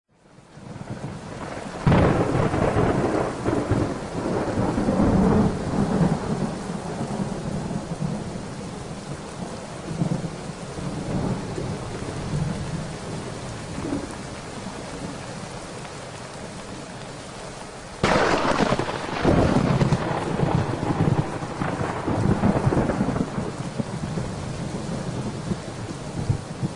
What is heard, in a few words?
Rain